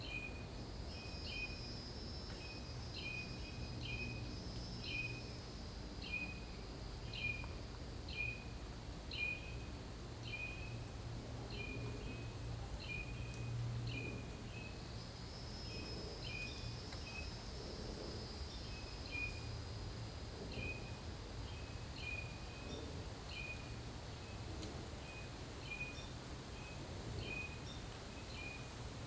Nature Cicadas Birds; ASMR

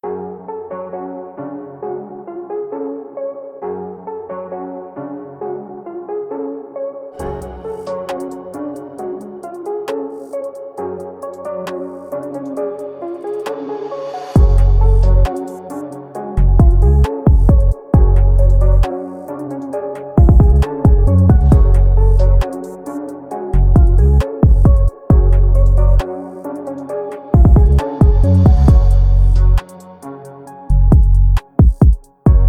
UK Drill Beats; Trap Beats

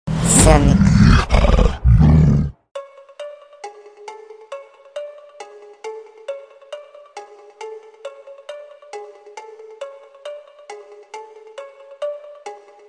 Bass

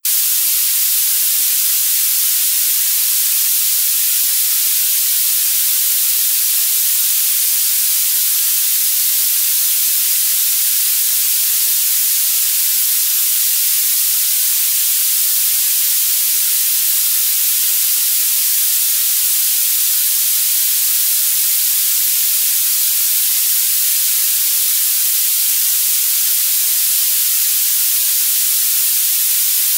Violet Noise

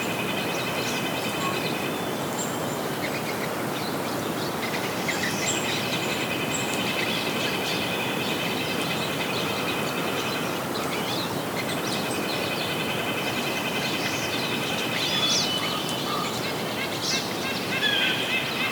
Woods Dawn Birds

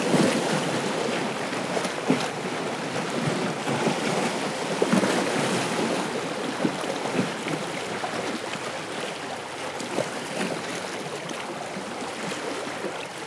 Ocean Small Waves

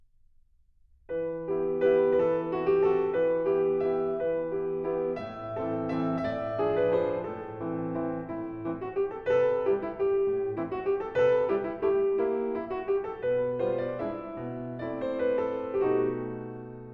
Waltz; Edvard Grieg

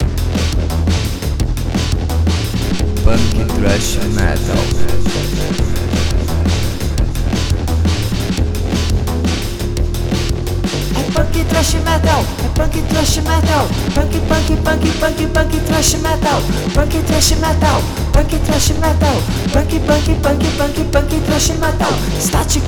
Punk Trash Metal

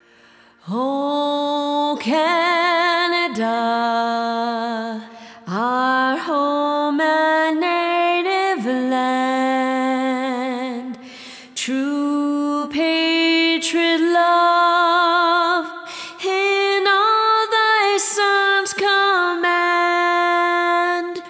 O Canada Acappella

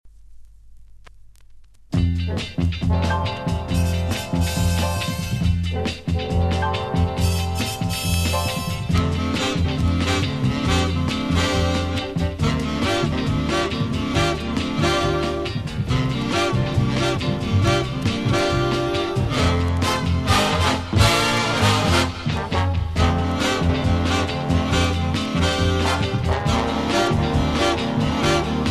Big Band

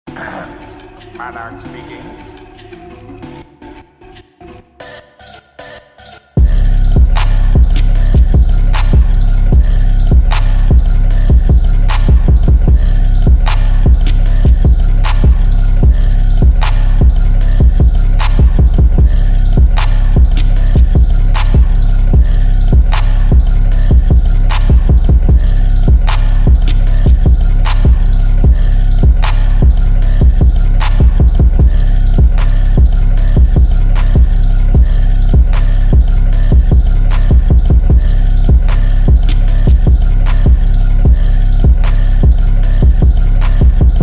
Slowed Reverb

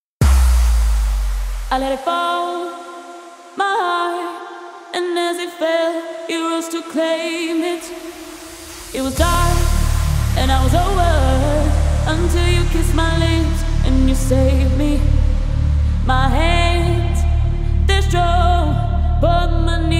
TECHNO

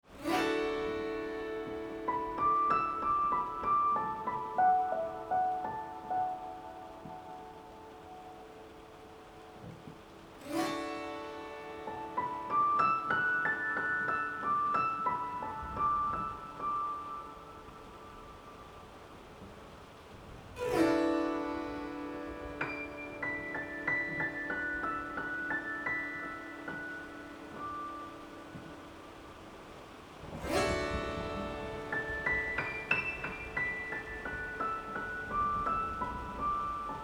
Harp

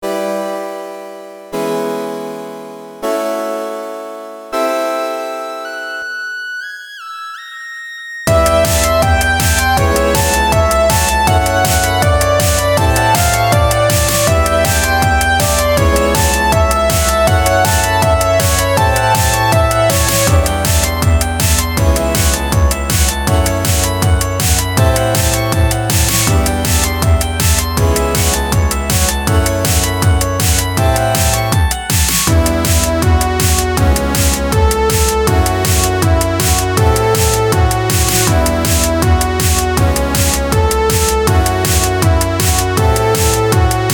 8bit

